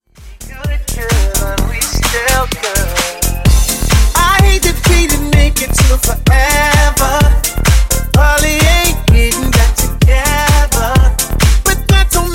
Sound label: Funk Bootleg Remix 128 Bpm